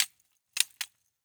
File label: Rollerskates, old fashioned, all metal, wooden wheels, clash, strike, clatter, ping, double, hesitate; Eiravaein Works; Vaeyan IV